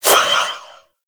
Proj Throw

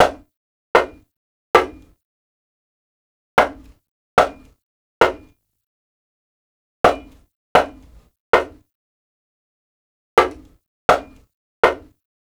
Knock Loop Close; Warframe; Entrati Labs

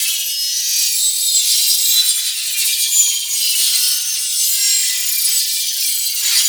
Spectral Sword Loop; Ultimate Abilities; Naramon; Warframe; Focus

